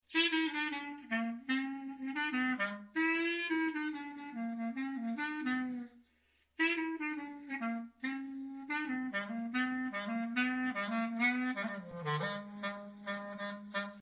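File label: test clarinet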